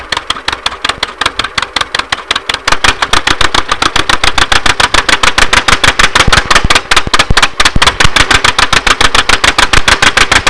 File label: work, tractors; poland